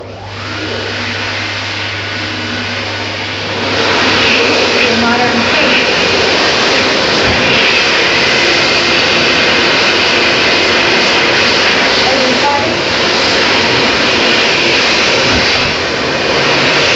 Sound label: hand dryer; hand dryer solos; the royal society st james